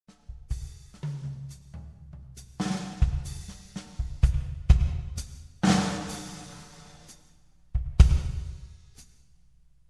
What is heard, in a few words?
fills